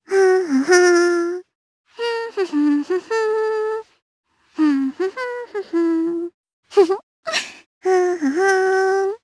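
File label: Vox Hum, Japanese; Hanus; kingsraid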